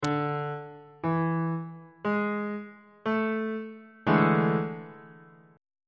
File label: g double sharp major 7th suspended 4th 1st inversion on bass clef